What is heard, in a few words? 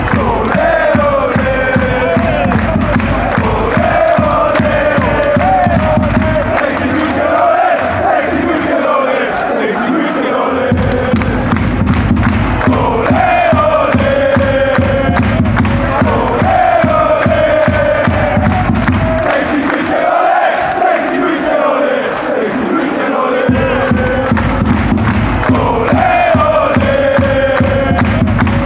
ole ole sechzig munchen ole